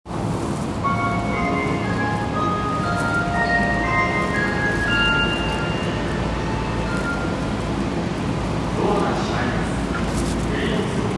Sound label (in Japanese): 銀座線溜池山王東, train sounds